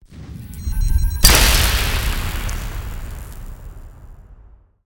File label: fx mine emp explosion